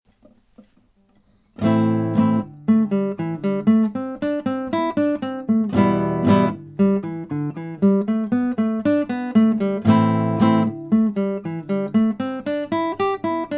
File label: Cool Stuff solo